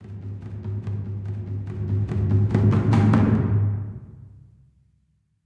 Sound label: Mountain Music, Mountain Music Diegetic Beat Drum Build